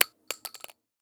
Shell 12ga carpet; STALKER; IWP

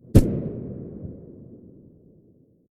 glock17 silencer mono far

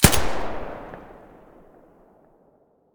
silencer ump45 mono, weapons

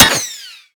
ricochet; STALKER; IWP

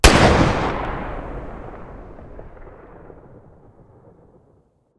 svd muzzle mono, weapons

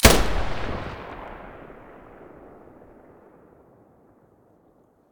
muzzle vector stereo, weapons

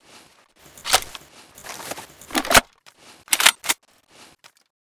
aa12 reload empty, weapons; STALKER; IWP

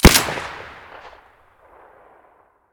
saiga silencer stereo, weapons

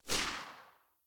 silencer p99 mono very far, weapons

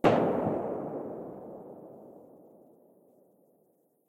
mdr silencer mono very far, weapons

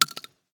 Shell 12ga gravel; STALKER; IWP

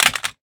pistol drop concrete; STALKER; IWP

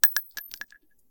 shell 39mm default; STALKER; IWP

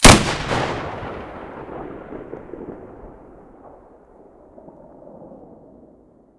muzzle sv98; weapons

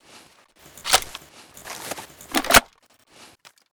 aa12 reload, weapons; STALKER; IWP

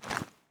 aim in, weapons; STALKER; IWP

